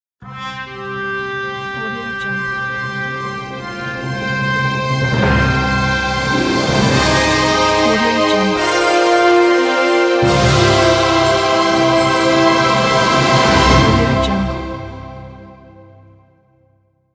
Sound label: grand opening logo